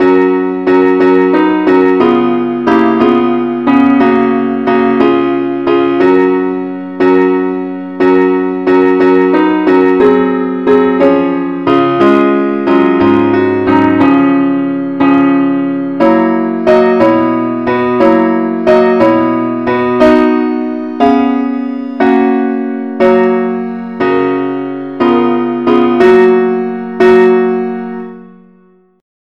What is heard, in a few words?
parts only key fis; angelvoices